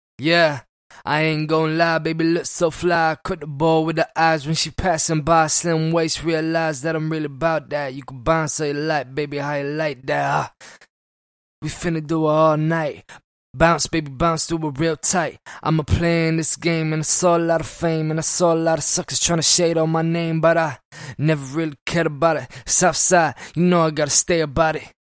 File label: Bounce HH EM 94, apellas